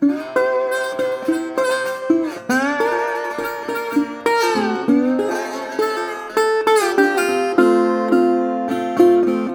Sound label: 120, Blues Guitar; ILIO - Fretworks